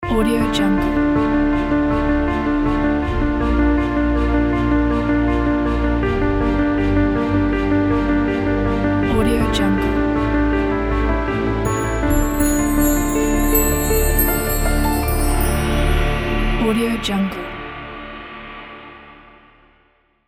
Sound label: classic logo